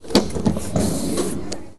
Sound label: door open